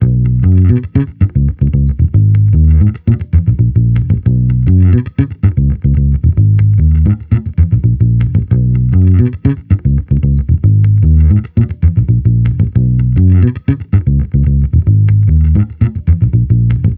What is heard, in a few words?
Amped loop 113 F#, Electric Bass Loops; Slate Digital BASSHOLE Sample Pack